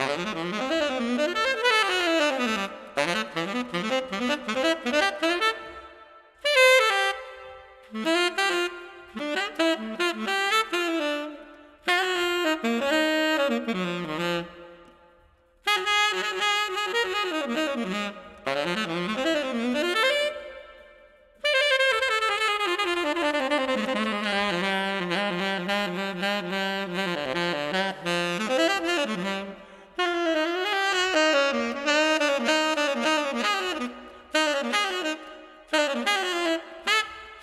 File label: battle sax; stranglehold; mus mega battle3 sax